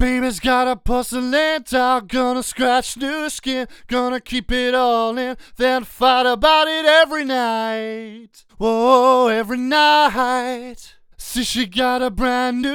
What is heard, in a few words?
Male Lead Vox